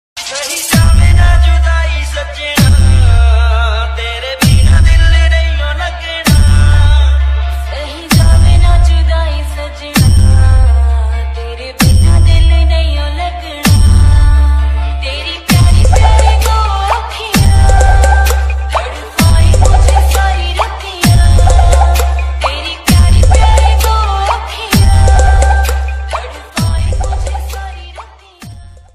Tik Tok Ringtone

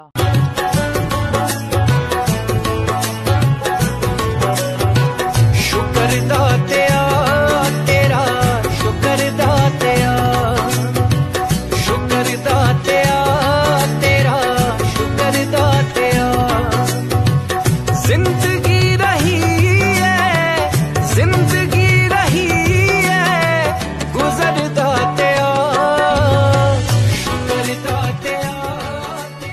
Punjabi Dharmik Ringtone

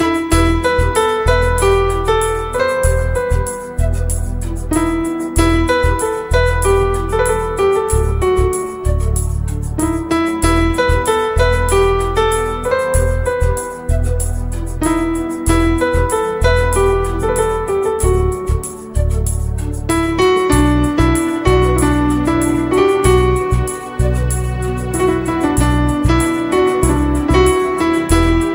Instrumental Song Ringtone

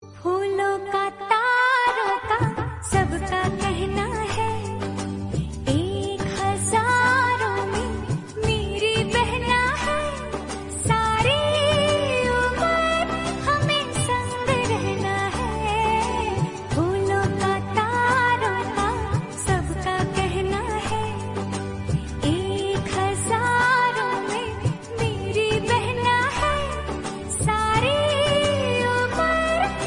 New Female Ringtone

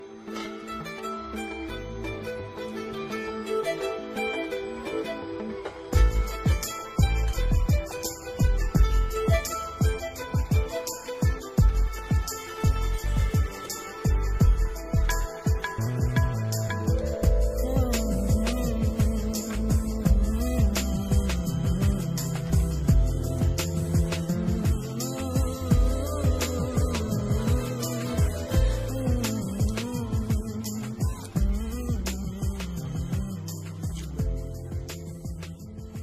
Hindi Real Music Ringtone; ringtones

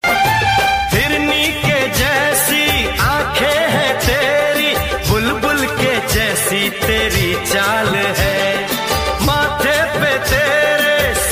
Old Ringtone

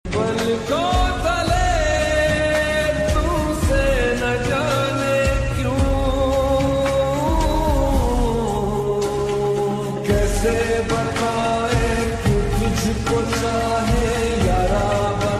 Hindi Sad Ringtone

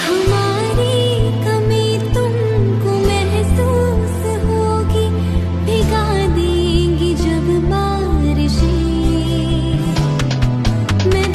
Sad Hindi Song Ringtone

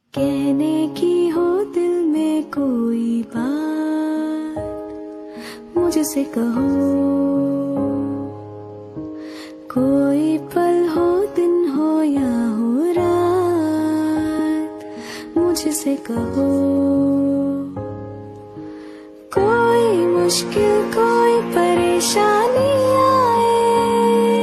Female Hindi Song Ringtone

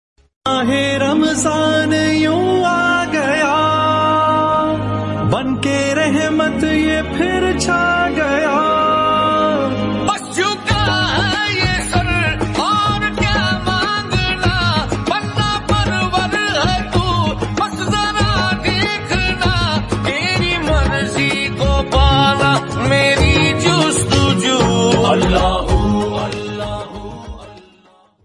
Ramzan Ringtone

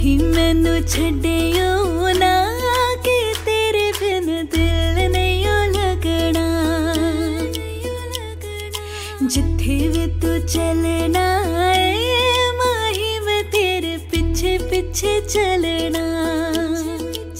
Ringtone Female Version

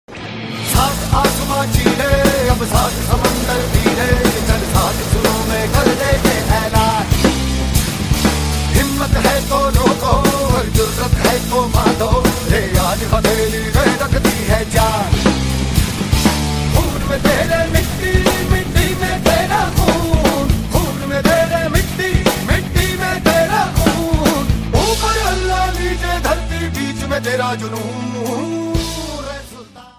Movie Title Song Ringtone

